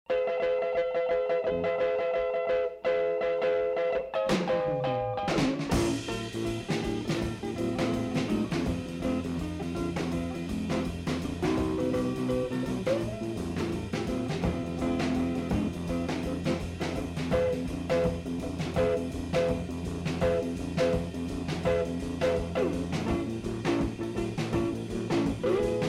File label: diddley beat